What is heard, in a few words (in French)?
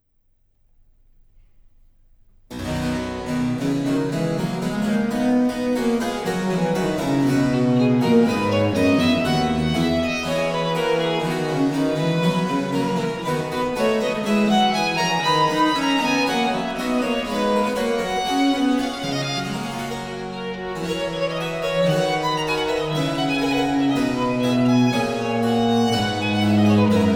la gamme; marais ut